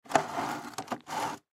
Crate Plastic Slide, Foley Crate Plastic Slide Movement Cement; Food; Household; Blastwave